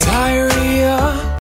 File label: die oh ree ahhhhhh